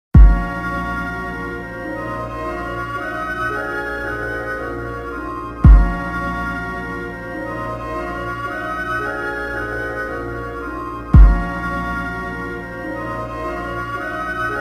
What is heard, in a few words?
Instrumental hip hop sample fight training